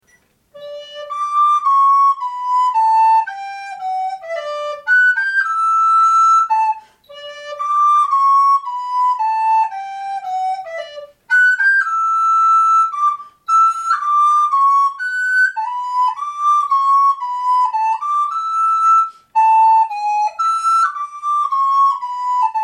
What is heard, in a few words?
Ayre, FLUTE